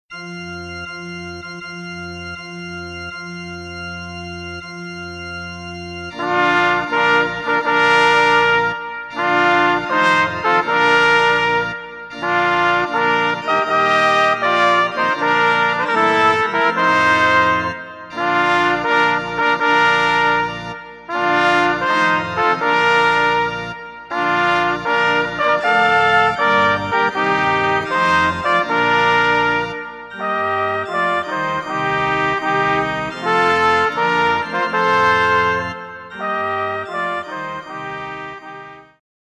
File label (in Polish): marsz wagnera 3 trabki